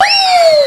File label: wiiieee